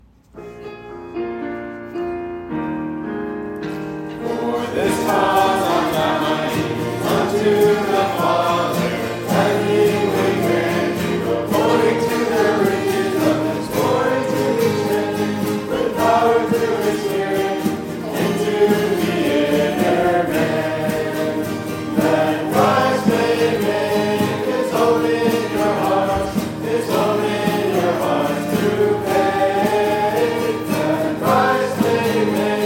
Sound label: sing, hymn; New Songs